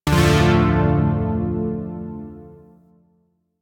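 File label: Shawzin Major Chord; Zariman Shawzin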